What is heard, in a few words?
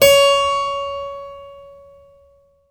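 harpsichord 8 C#5; HPSCHD 8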